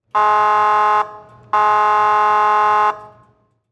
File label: Auto Motorcycle Honk Horn Engine Cooling; Transportation